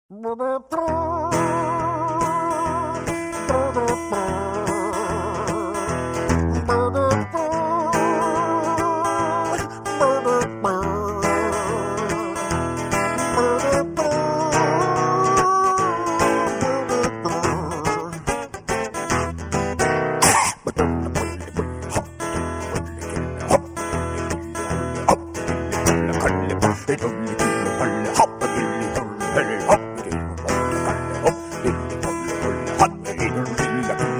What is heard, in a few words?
Cough; Budíček